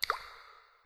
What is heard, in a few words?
drip